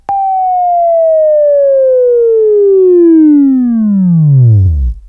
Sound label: Buzz